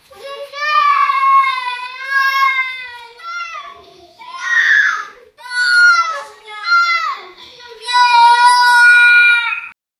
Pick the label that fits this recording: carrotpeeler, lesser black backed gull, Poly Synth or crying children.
crying children